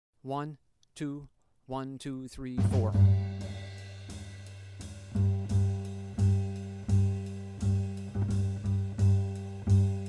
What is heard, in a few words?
Comping Backing Track; piano guitar